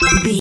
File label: ui menu button beep